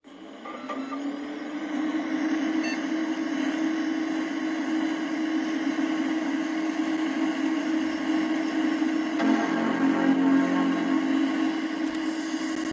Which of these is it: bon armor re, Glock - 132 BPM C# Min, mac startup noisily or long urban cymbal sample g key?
mac startup noisily